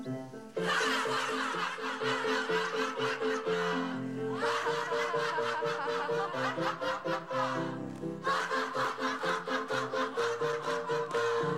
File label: women laughing